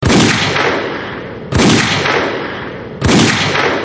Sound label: 12 Gunshots Sms